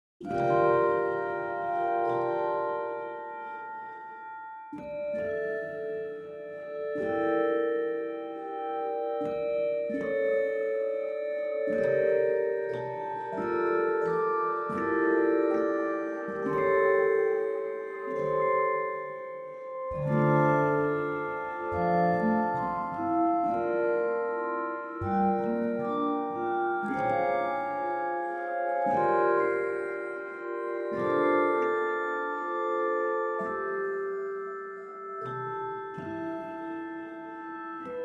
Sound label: preset magical glimmers, dulcitone; frozenplain